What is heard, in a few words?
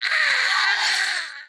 Female zombie Death; Zomboid 41.78.16; Project Zomboid